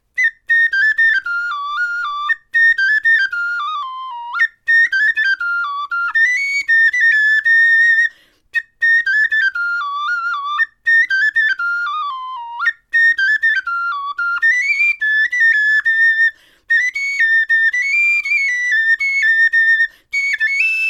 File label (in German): melodie